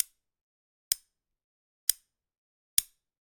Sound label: Generic Click Metal Tiny